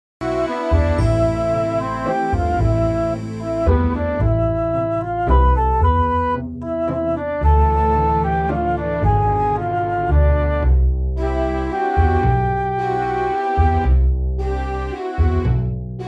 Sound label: serious show tune